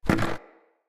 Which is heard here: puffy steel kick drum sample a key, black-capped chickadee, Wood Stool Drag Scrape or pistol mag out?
Wood Stool Drag Scrape